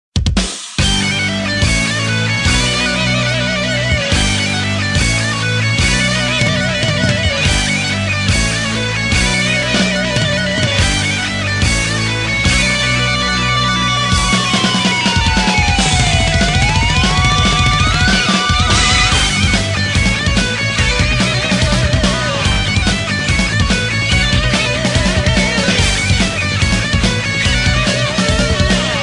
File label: rock guitar version; ringtone